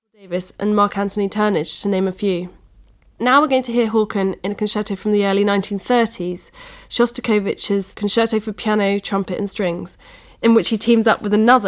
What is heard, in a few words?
concerto for piano trumpet strings in C minor; dmitri shostakovich